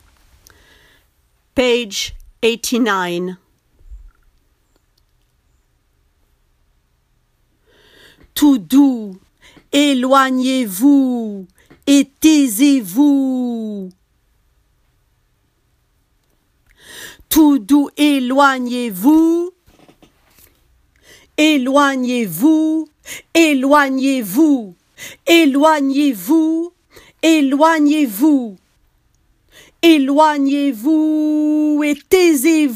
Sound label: bas; Carmen